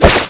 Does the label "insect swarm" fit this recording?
No